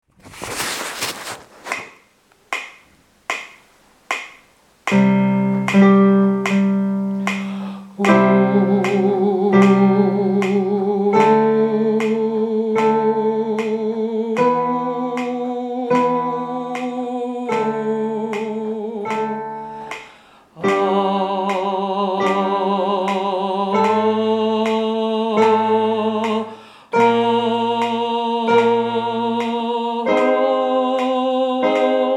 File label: tenor; hostivarsky koncertni sbor